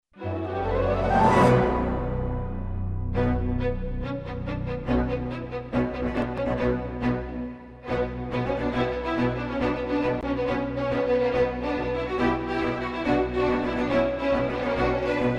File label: Epic Space Orchestra